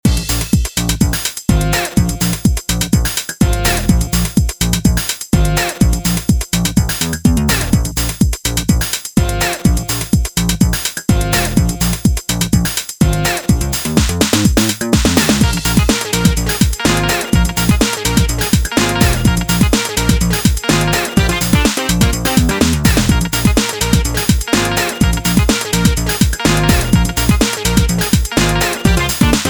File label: Funk; streets of rage remake